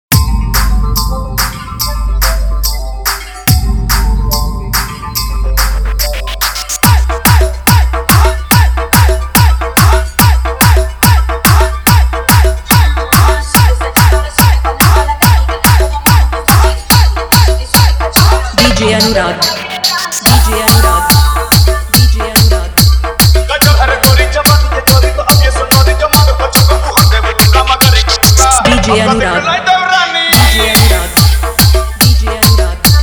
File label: Desi Dance Full Electro Dance Mix